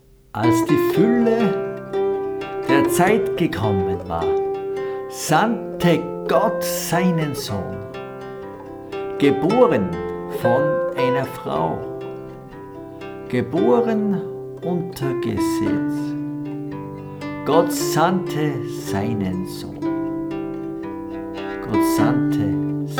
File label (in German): Christliche Volksmusik Lied